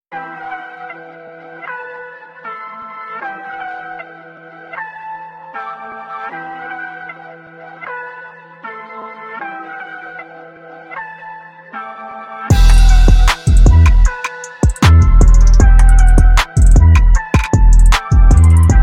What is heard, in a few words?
Instrumental Rap Hip Hop Beats